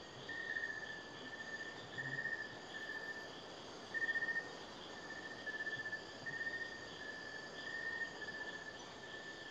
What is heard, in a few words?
Tree Bird, ambience